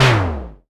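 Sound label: snare, Korg MS-20 Drums; Polyend; Simulacrum Sounds; 1 Shots; Synths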